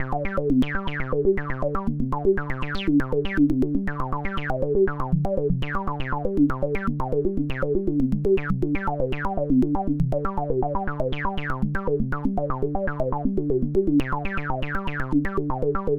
Bass Percs; supercollider-haskore

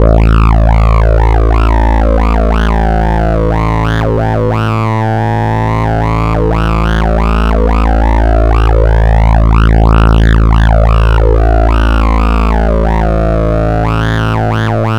bass filter; lange-nacht-2009

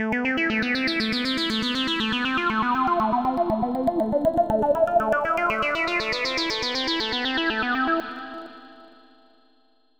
Filter Saw; lange-nacht-2009

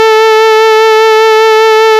saw vibrato; lange-nacht-2009

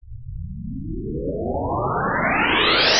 Noise Cheby A Lowpass

